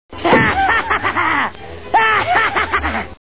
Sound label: hahaaaha